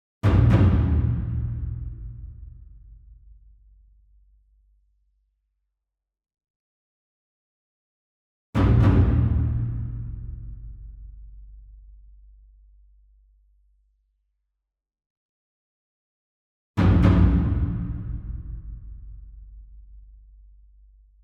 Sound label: ENSEMBLE DRUM Taiko Double Far; boom library; Cinematic Strikes Construction Kit